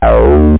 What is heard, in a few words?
acid